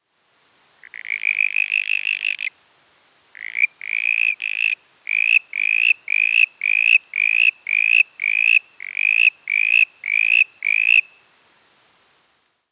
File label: verreauxs tree frog litoria verreauxii; lyagushek i zhab